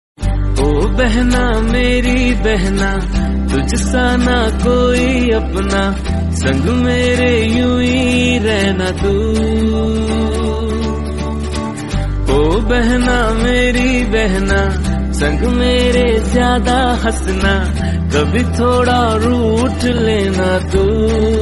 Sister Birthday Song

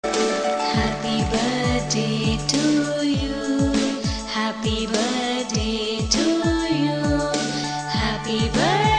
Birthday Song For Sister; Hindi

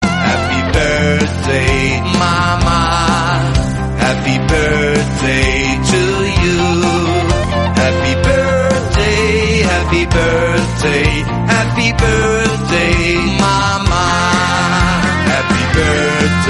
Birthday Song For Mama; Hindi

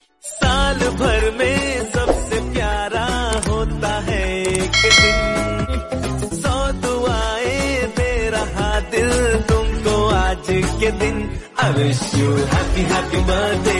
Sister Birthday Song Dj, Ringtone

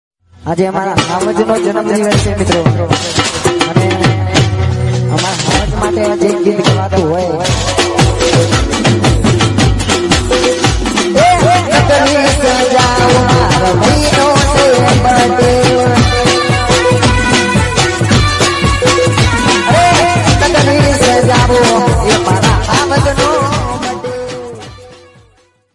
Friends Birthday Gujarati Song; Happy Birthday

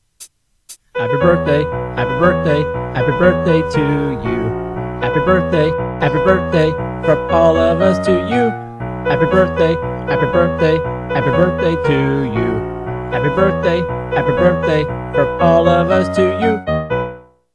Happy Birthday From All of Us to You

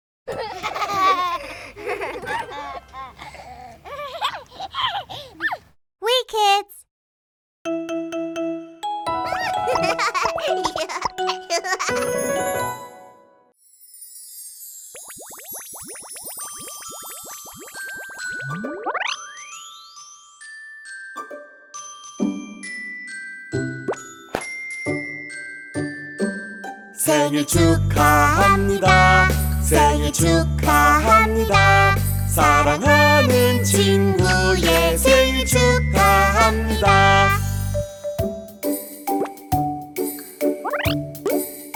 Happy Birthday Song In Korean Songs For Children; 생일 축하 노래

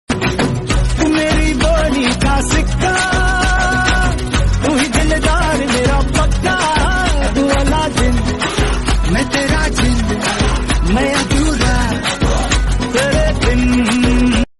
Brother Birthday Reels Song

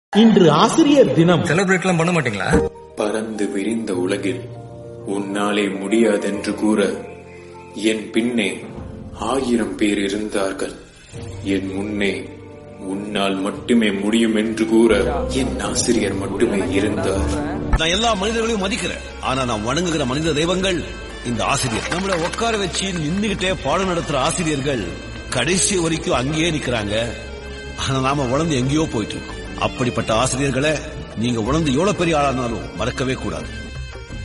Teacher Birthday Song Status Tamil; Tamil WhatsApp Status

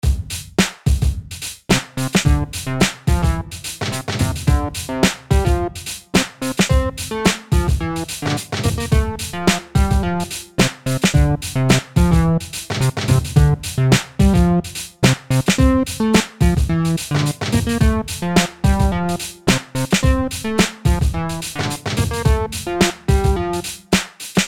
happy birthday hip hop

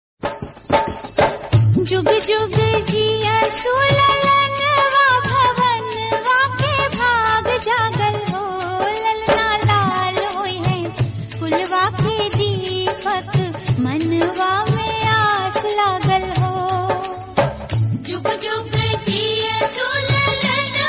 Bhojpuri Birthday

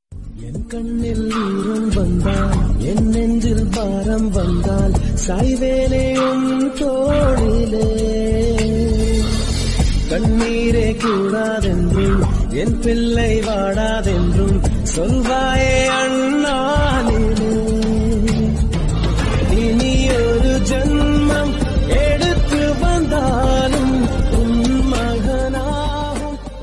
Happy Birthday Wishes For Amma Tamil Song

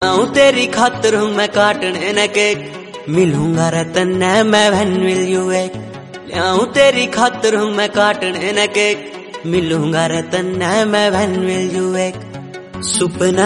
Happy Birthday ( Haryanvi )

